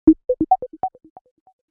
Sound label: Realme SMS; Realme GT5 Pro